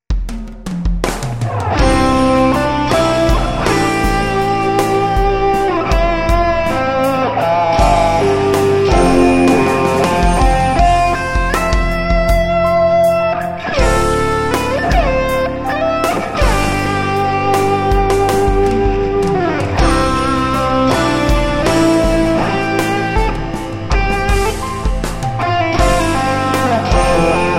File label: POP BALLAD SOLO